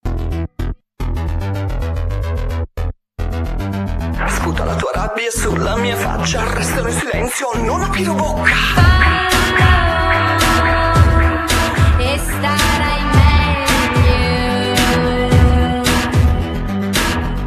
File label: love song